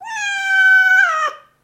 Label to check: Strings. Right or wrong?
Wrong